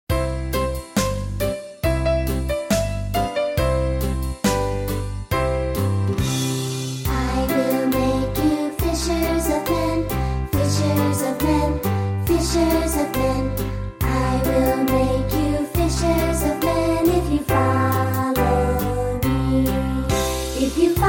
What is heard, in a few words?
Childrens Choir